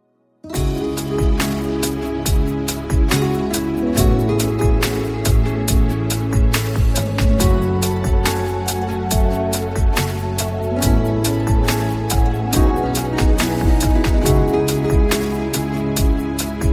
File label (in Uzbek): romantik rington telefon gudok chaqiruvi uchun